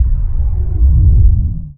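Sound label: Laser Fire Interior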